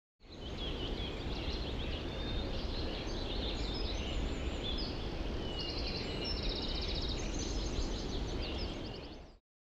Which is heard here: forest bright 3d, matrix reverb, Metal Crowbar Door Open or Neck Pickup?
forest bright 3d